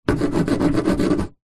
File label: foley wood scratches with metal object; zapsplat